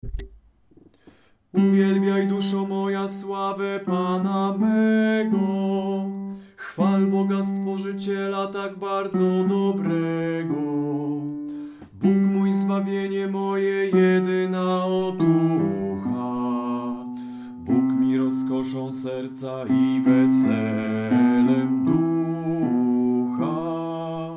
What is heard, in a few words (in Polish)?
bas; melodia; magnificat